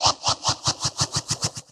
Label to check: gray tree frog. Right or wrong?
Wrong